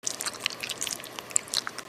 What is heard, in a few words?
slosh; Lewd SFX